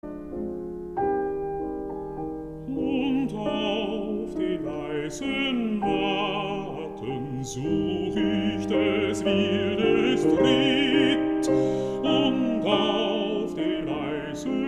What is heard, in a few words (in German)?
Vokalmusik